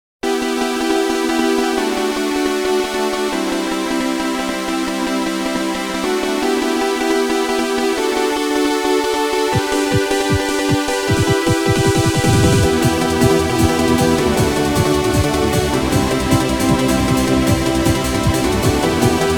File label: glide, bgm